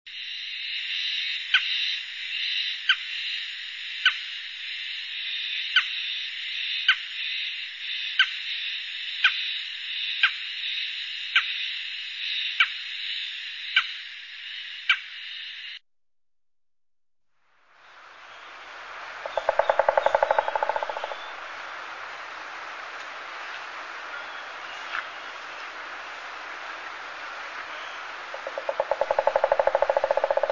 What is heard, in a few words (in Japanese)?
ooakagera, birds